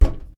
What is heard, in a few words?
Basketball